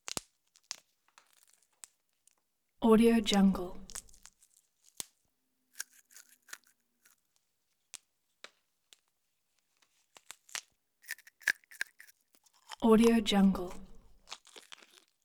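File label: brush in teeth close up brush snaps cracks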